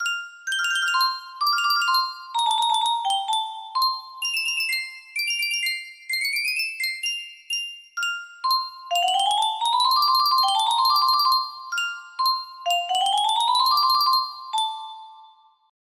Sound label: music box